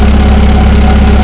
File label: ford eng; Chase Hollywood Stunt Driver; XBOX